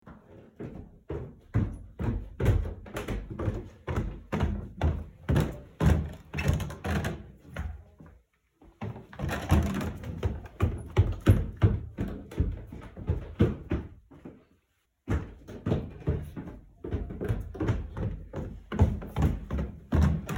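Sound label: wooden stairs creak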